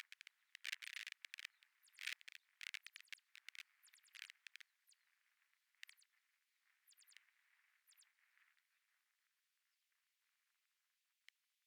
DRONES

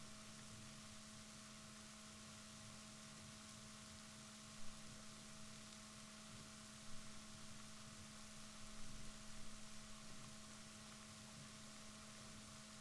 rorquals negatif, Balaenoptera Physalus Chile

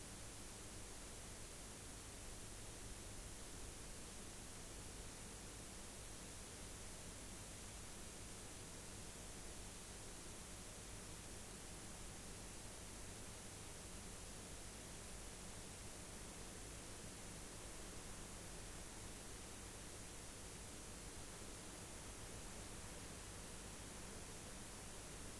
rayol farm; SEAMED

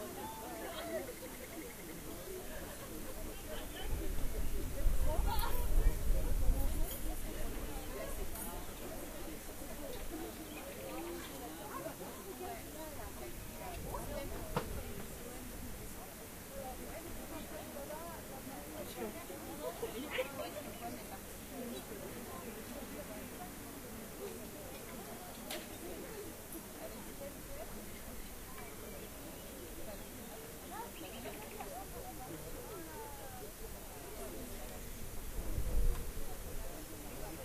rayol farm; SEAMED

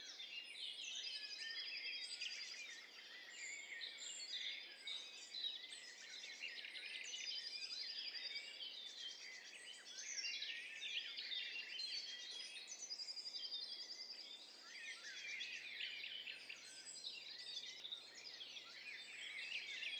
STEREO BIRD COCKTAIL PARTY

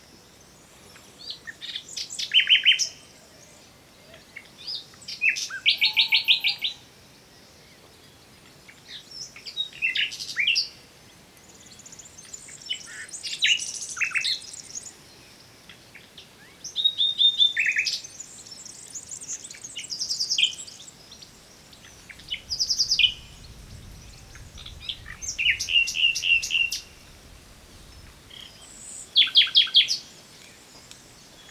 Luscinia megarhynchos; SETE

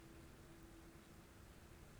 pulses, BALAENOPTERA; MONACO 2022-07

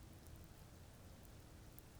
pulses, BALAENOPTERA; MONACO 2022-07

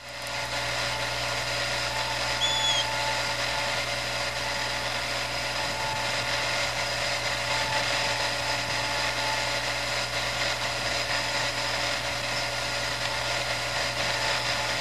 bird

